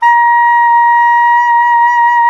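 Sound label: saxophone soprano saxophone; Roland - LA Composer vol. 1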